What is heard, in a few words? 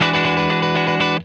E JANGLE, Funk Guitar; Zero G - Funk Guitar